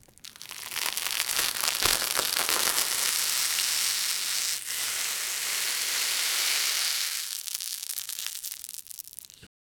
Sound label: the sound of juicy sweet, asmr